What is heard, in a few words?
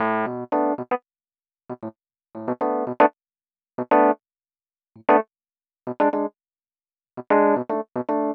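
115 Pop Mark II Bb major, E-Piano; Pop Rock; E-PianoClav; Pro Tools Essential Loops; Digidesign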